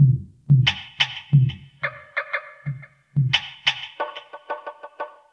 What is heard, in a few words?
090 Reggae Percussion; Digidesign; Pro Tools; Pro Tools Essential Demos; Essential Loop Demos; Demo Session - World